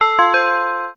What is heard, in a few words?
gong; rozklad; zapowiedzi